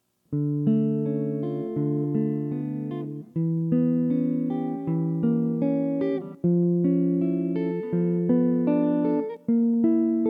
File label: Min 6th and Dim Chords borrowing, jazz chords; Barry Harris harmonization